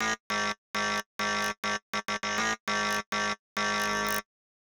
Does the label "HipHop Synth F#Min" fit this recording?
Yes